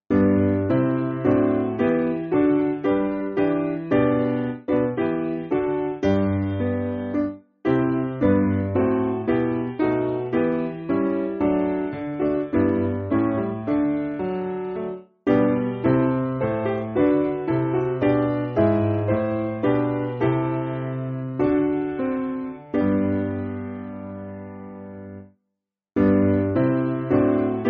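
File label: Piano, Music Backing Files for Churches; Simple Piano; O Thou Not Made; Golden Treasury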